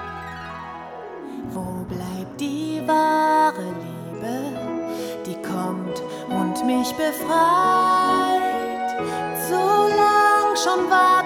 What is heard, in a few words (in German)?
Gesang Deutsch Filmmusik